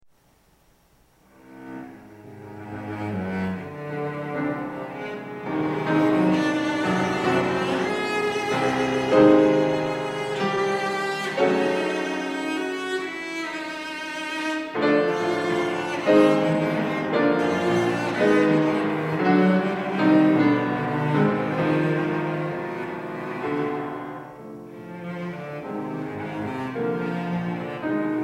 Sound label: Sonata for Cello and Piano Allegro ma non troppo LIVE; Barber; Op6 I